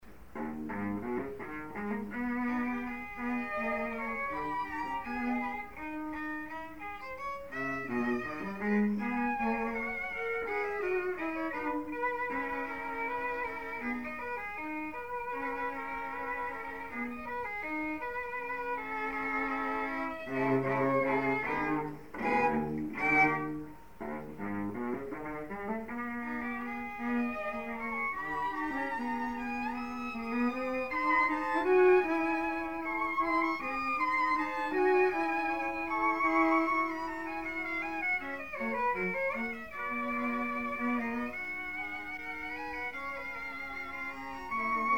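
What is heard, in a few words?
string trio; hopfe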